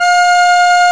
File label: ACCORD F5; Roland - LA Composer vol. 1